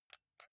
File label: console controller xbox rb press slow soft